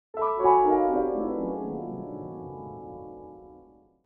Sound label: Game over you lose fantasy